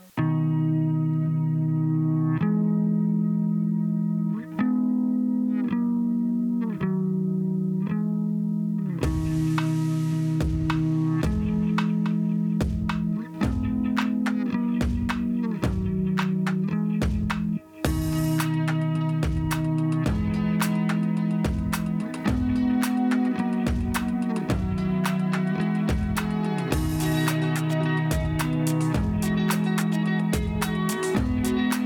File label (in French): Musique